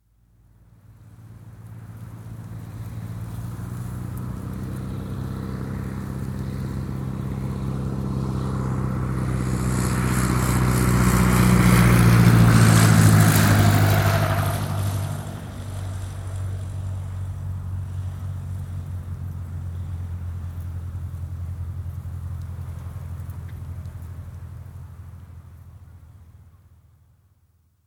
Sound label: Cessna C150, exterior, pass, land (Light aircraft, single piston engine); Airplane Sound Effects; BBC